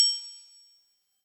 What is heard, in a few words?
Collect star